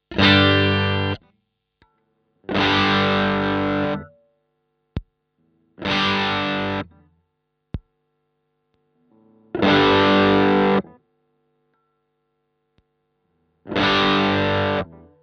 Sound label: BM20 ULTRA Gain max Ge Ge Tone Knobs